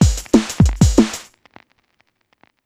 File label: Vinyl Groove, Yamaha DD-35